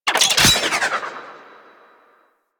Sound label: Detach; Warframe; Sharkwing; Vehicles